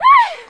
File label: Scared Female; Friday the 13th Killer Puzzle; Android